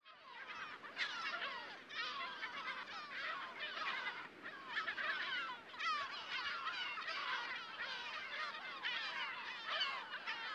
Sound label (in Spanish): GAVIOTAS EN CERRO LA MINA ISLA SAN LORENZO; ave; Aves; Mar; olas; paloma